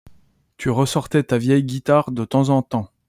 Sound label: guit